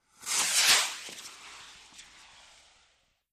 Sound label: Rocket Flare Gun: Firing By Into Sky. Stereo; small rockets